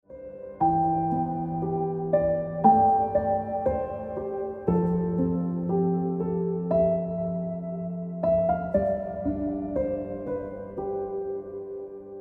Ringtone Piano Music

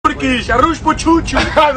Turkish Turkey Ringtone

